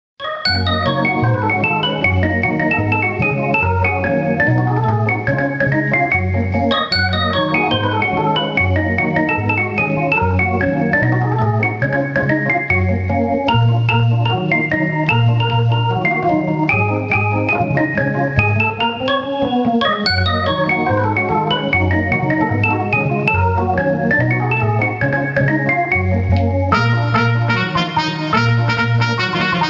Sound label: pre game song